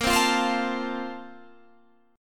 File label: A sharp Major 7th Suspended 2nd, Guitar, Half Step Down